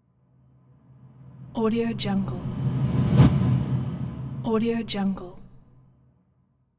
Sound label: whoosh transition movement